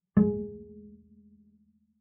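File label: Bass pizzicato fortissimo sul D Ab2, Double Bass; MIS Pitches - 2014; Strings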